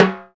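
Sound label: PER PROCU, Procussion, percussion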